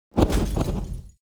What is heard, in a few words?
riot begin; Shelter